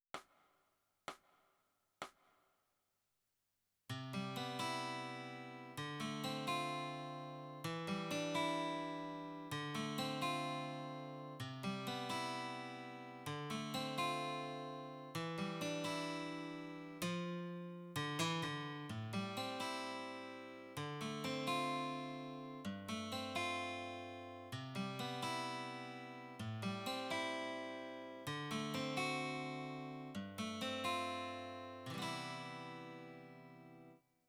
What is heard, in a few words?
Guitar